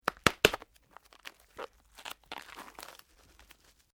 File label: Artificial Snap Tear; Various Organic Foley sounds; Trucks; Vehicles